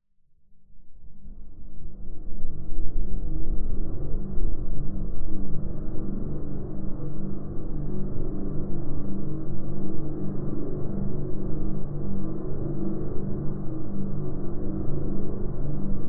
science fiction forcefield energy source dark growling droning